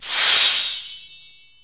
Sparkly Woosh; PC; MARIOTEACHESTYPING2

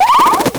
baby drill; DS; MARIO LUIGI PARTNERS IN TIME

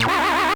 feather; SNES; SMK

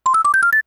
flower; SNES; SMRPG

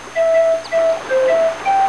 whistle; GAMECUBE; SUPERMARIOSUNSHINE

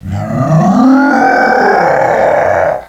grawrrrrr; DS; ML BOWSERS INSIDE

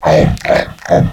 eating; DS; ML BOWSERS INSIDE

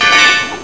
bells; GAMECUBE; SUPERMARIOSUNSHINE

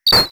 enemy bubble burst; SNES; SMRPG

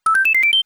1up; SNES; SMRPG

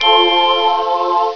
Wonderful Ding With Choir; PC; MARIOSFUNDAMENTALS

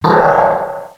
gwahhh, Echo (inside caves, underground ect.); DS; ML BOWSERS INSIDE

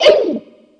ooh; N64; DRMARIO64; metalmario

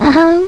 uhhum; GBA; MLSS

